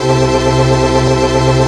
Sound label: ORGAN, 160 BPM, Drum & Bass; Ueberschall; Drum & Bass Flash Trackz